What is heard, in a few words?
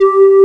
S-LEAD; AKAI S-Series CD-ROM Sound Library VOL-8